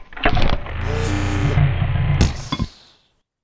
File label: burger machine